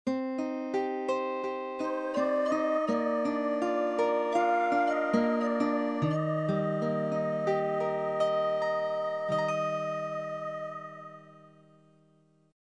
Calm Spell; quest for glory 1 original soundtrack

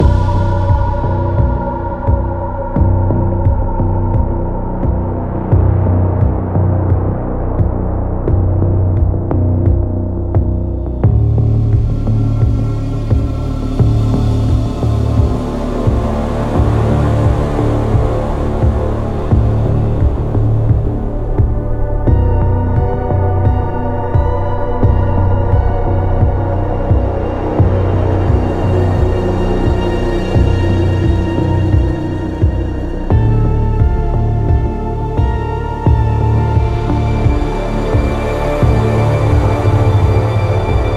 Amb Night Music; Fortnitemares

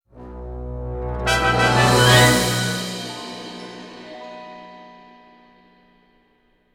Music Battle Win; Costume Quest 2

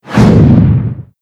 jetpack other

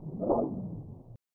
Information Menu Swish; Pitfall The Mayan Adventure; SNES

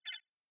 Error

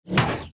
Car Crash Sound; Dude Theft War

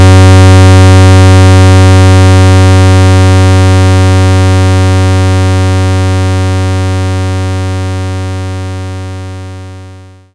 Pulse 93 75Hz; knights try

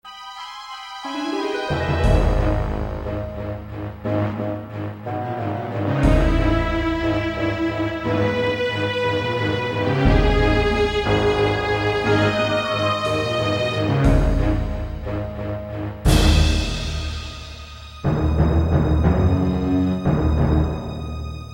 Suspense Action; Star Wars Battle for Naboo

